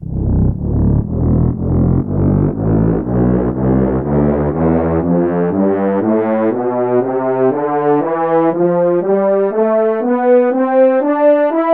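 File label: french horns scale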